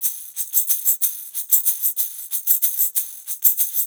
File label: 6-8 Metal Bells, Perc Loops; Drum Tools